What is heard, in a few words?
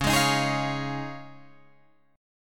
Db Major Add 9th, Guitar, Drop D